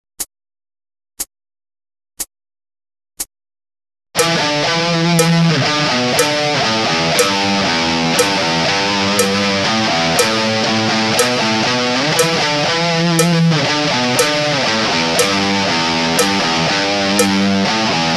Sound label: Riff Slo Mo, guitar